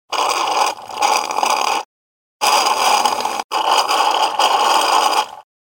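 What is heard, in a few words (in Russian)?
пьют через трубочку соломинку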